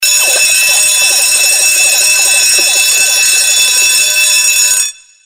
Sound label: bell ring; Lucky's Fish and Chips